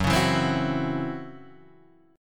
C sharp Minor Major 7th Double Flat 5th F sharp, guitar chord